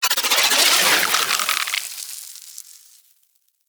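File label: Proto Infested Mutalist Projectile By; Warframe; Abilities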